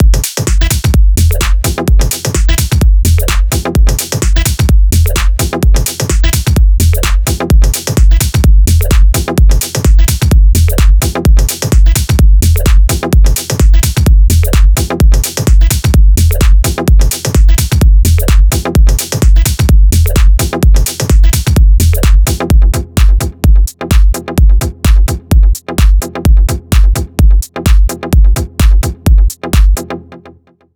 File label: jamming; BPM 128